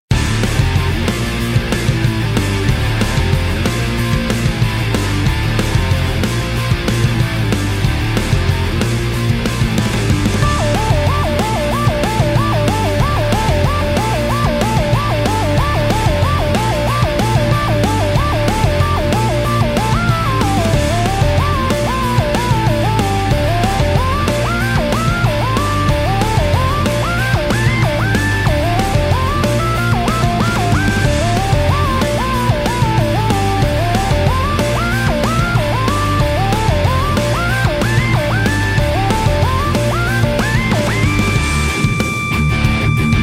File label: Bell BGM; Delicious Pretty Girl Mahjong